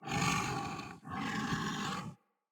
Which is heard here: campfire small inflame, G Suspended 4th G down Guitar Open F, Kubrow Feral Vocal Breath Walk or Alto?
Kubrow Feral Vocal Breath Walk